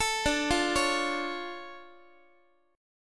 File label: D Minor Major 7th D down Guitar; x,x,12,12,9,12; DmM7 arpeggiated; JGuitar